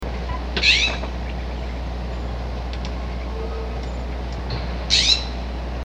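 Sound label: Steller's Jay shrink call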